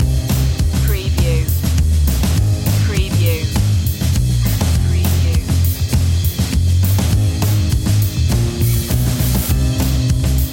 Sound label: showroom loop